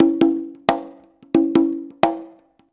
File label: CONGA, Modern Percussion Loops